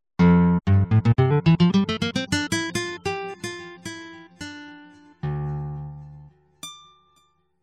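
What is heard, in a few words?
Nylon Guitar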